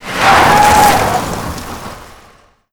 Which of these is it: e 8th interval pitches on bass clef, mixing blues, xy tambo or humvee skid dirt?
humvee skid dirt